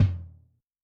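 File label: bass drum room, Kick, Yamaha DTXpress-II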